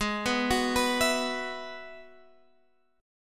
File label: G sharp Minor 7th Sharp 5th, Guitar Half Step Down